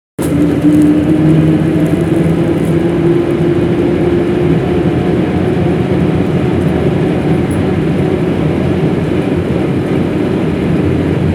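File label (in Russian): звук автобуса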